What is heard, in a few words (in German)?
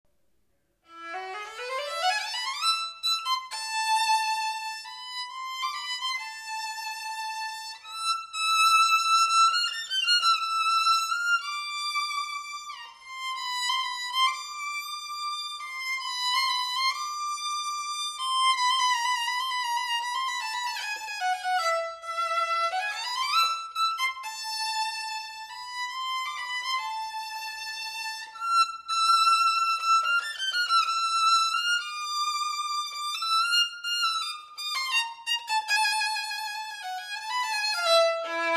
reif lieblich hell